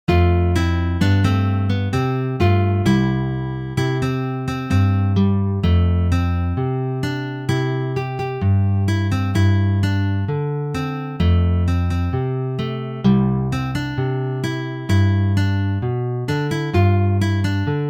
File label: instrumental